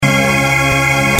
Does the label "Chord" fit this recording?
Yes